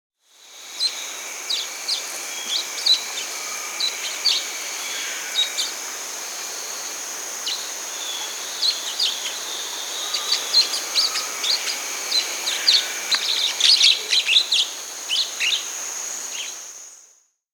Amazonian Parrotlet (Nannopsittaca dachilleae), Peru; S. America